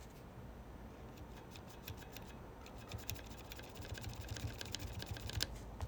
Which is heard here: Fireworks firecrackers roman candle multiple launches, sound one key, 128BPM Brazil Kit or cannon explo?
sound one key